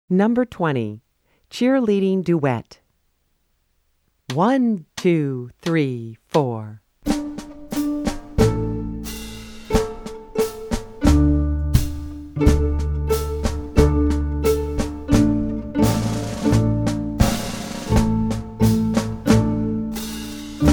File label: Cheerleading Duet